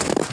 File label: ice